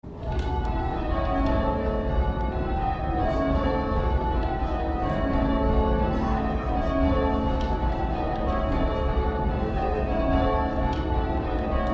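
ringing down 1-4 with flattened 6, Worcester Cathedral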